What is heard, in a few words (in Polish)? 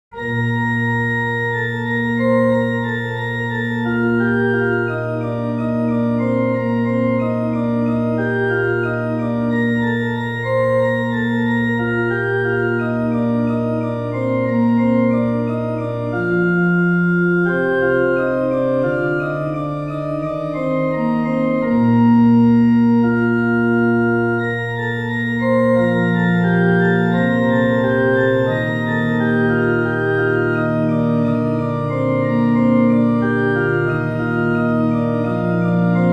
organy; Muzyka Ilustracyjna